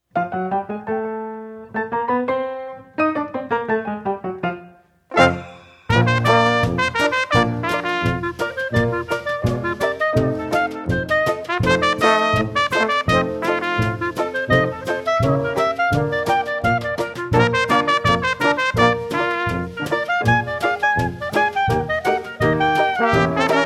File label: ragtime dance